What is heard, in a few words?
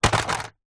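leisure radio controlled toy car large plastic set down drop carpet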